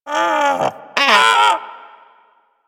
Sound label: Sea Bird Vocal Idle; Warframe; Hunting Critters; Ostron Sea Bird